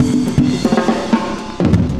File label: cooling 120, loops, 120bpm